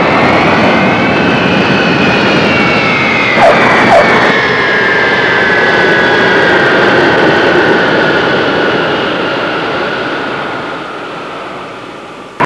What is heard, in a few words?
737 LAND, Aerei; trasporti